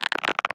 Chitter

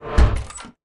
Door Close

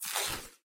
Break Web

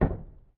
Aluminum; Lethal Company

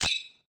Kill Doublewing

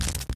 Bee Zap

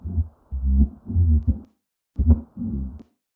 Strange Noise

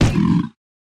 Ram

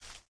Rustle; Lethal Company

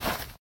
snow

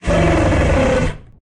Long Roar

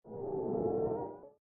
Wind Outside Ship; Lethal Company

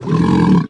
Short Roar

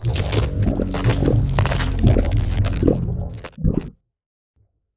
Giant Eat Player

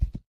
Step

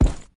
Hit Carpet; Lethal Company

